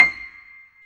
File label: PIA PRO, Roland